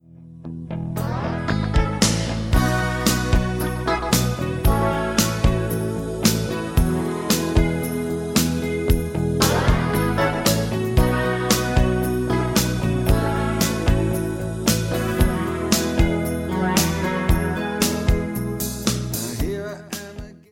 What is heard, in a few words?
Synclavier